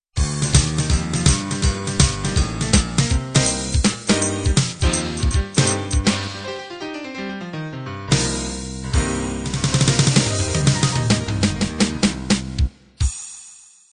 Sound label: boogie woogie end